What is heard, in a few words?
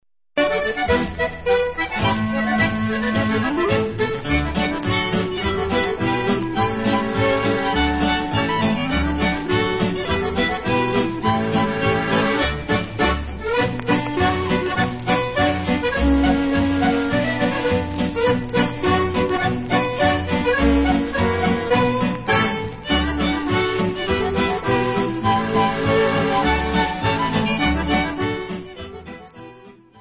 Galop, Instrumental